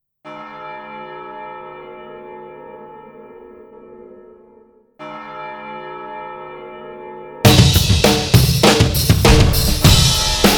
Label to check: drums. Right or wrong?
Right